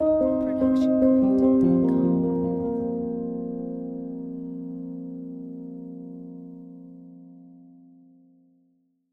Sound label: Friendly Ident; SoundsCrate